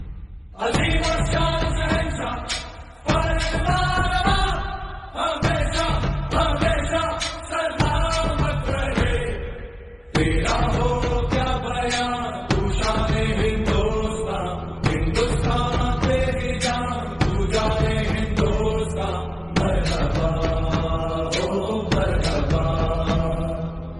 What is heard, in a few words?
hindi; jodhaa akbar